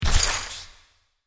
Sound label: SE sys anl ok